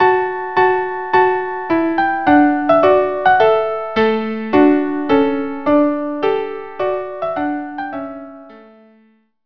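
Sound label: trumpet tune violin viola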